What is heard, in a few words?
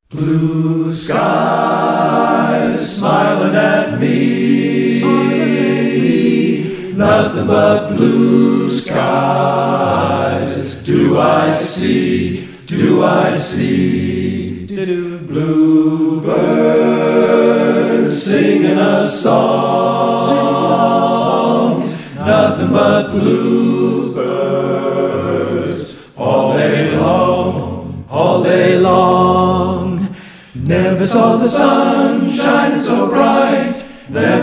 acapella